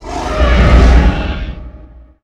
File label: Roar & Scream, Vocals Monster; Sony Pictures Sound Effects Series